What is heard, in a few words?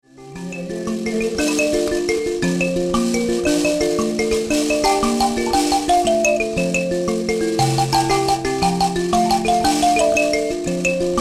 mbira